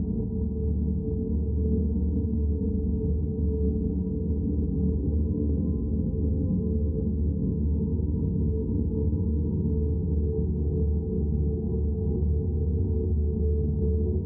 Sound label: Cave Ambience